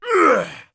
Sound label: bear2 hit; Escape from Tarkov